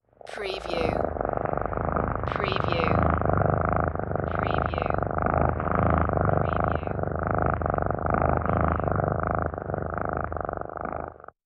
Cat Purrs Long Constant

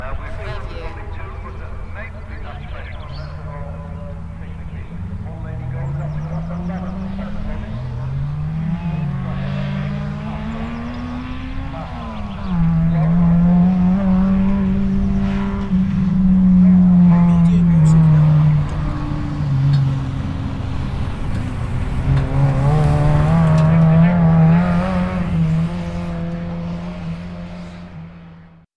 Rally commentator distant and racing sounds; beanstalk audio

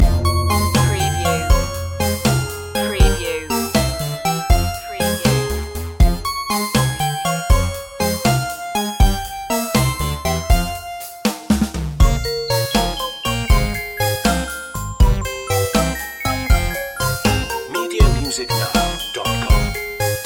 retro video game lazy world loop

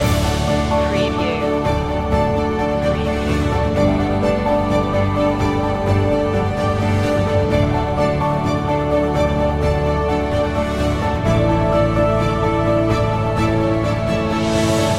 how far can we go loop

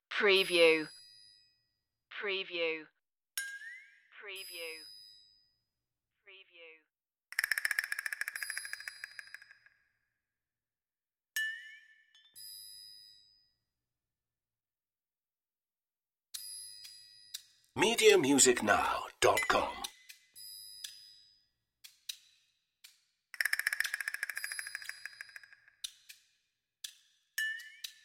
perc only

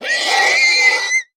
Horse Stallion Neigh; Sound Effects Bible

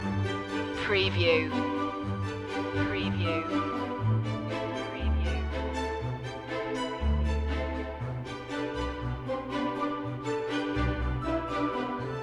blooming spring short loop

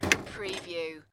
Pinball sound, heavy thump and clank

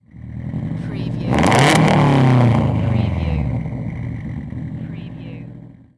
SUBARU STI EXHAUST SINGLE REV

